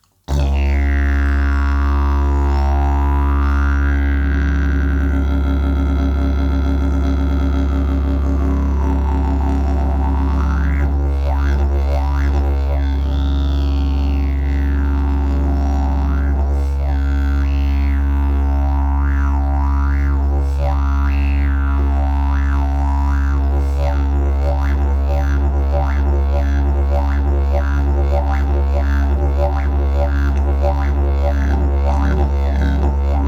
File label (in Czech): javor, didgeridoo; javor bandaznik